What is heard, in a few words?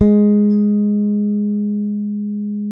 bass sustain G#3; Sampleheads; Will Lee Bass Library